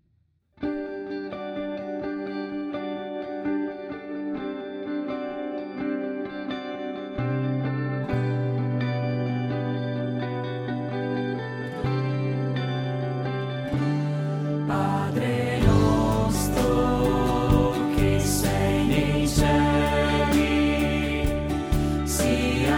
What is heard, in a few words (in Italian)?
padre nostro basso